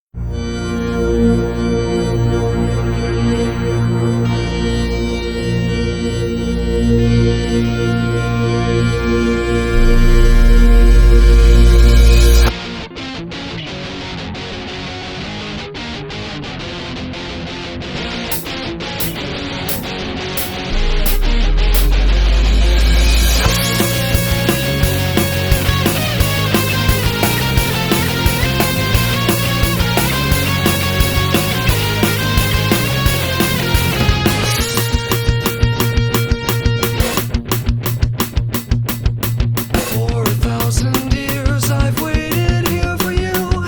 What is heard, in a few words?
Eurobeat; Pony Music Archive 20.03